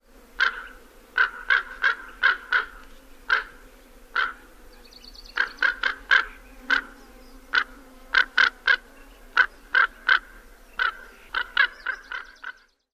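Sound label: calls, Common Raven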